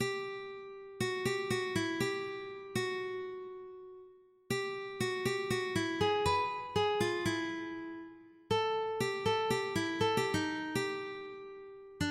dream, ukulele

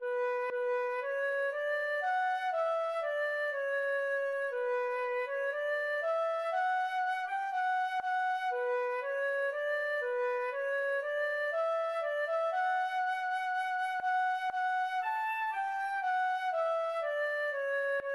aves, feadóg

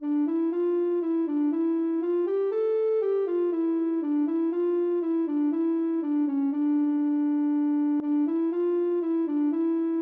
ocarina

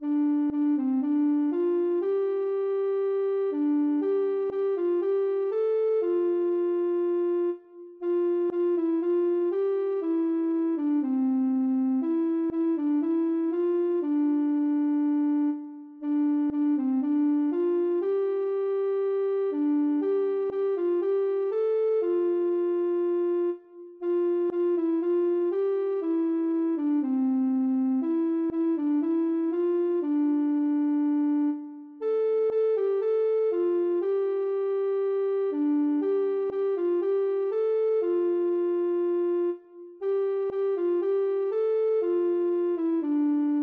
ocarina

